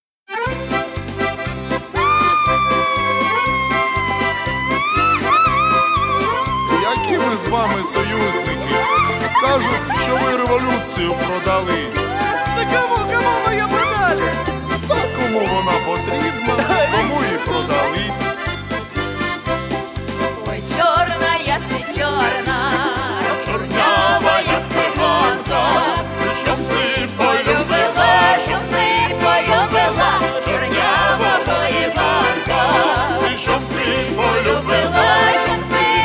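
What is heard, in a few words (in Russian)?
украинские народные песни; oy chorna ya si chorna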